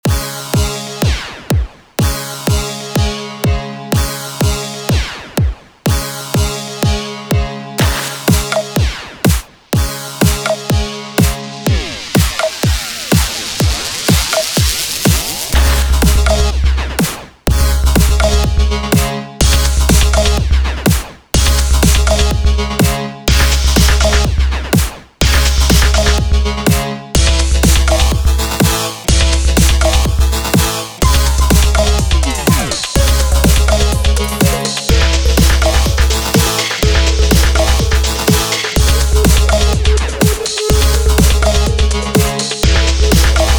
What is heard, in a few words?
bassy and basic; weeklybeats 2024